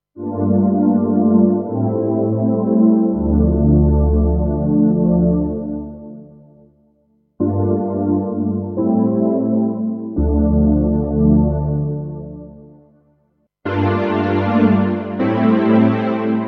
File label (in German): Etheral vorher nachher